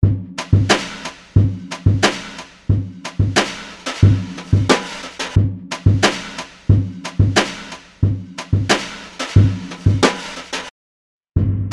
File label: jazz brushes; cheesy BBD Reverb; reFUSE Software Cheeseverb